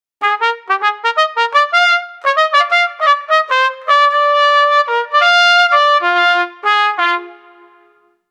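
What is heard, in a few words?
trumpet solo upbeat uplifting; brass instrument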